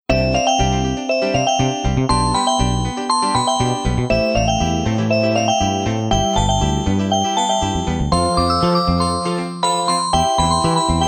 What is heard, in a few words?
happy; HTC; Cingular-8525-WM6